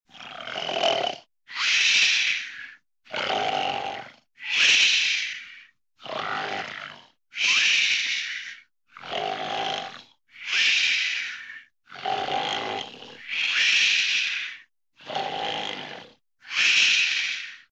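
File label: cartoon snoring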